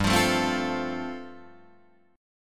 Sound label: G 9th Suspended 4th, Guitar Drop D